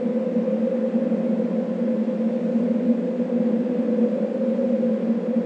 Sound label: amb wind; ambient